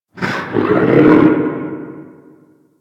Infested Distant Creature Snarl; Warframe; Corpus Infested

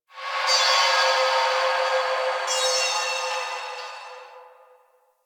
Exterior Organic Drones; Ambience; Warframe; Railjack

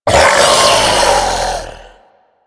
Infested Femur Shoulder Fire Start; Warframe

